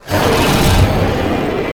hit, dragon green small

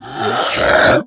zombie skeleton

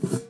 pickup, figures

